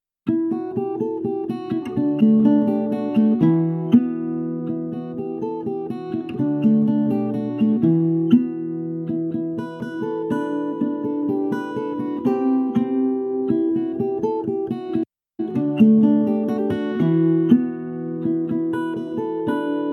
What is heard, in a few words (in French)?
musique bretonne; Cote de granit rose